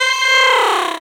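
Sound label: vocal, 135 BPM, drum & bass; Ueberschall; Drum & Bass Flash Trackz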